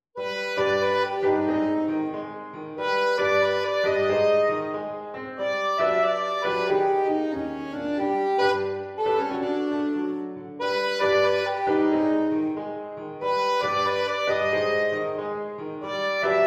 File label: SAXOPHONE SWING STYLE SAX